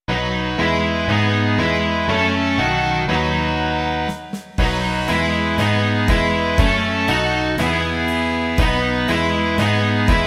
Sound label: rock